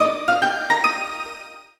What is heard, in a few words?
Flying Mail